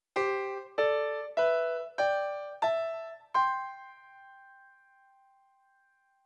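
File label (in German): CHINESISCHE QUARTEN g-c trumpet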